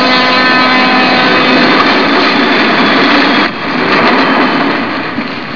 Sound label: train, ambience; cstrike